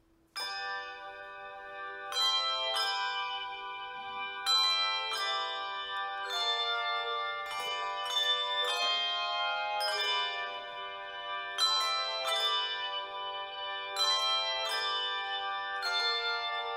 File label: Carillon for a Joyous Day; Wagner